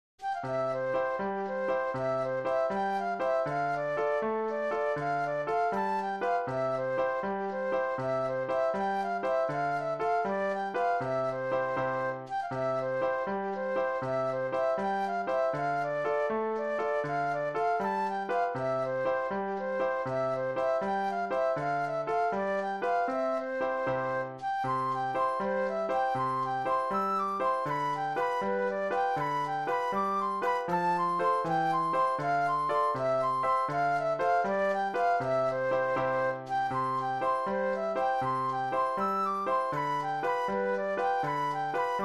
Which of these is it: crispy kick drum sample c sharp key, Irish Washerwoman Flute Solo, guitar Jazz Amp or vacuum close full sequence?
Irish Washerwoman Flute Solo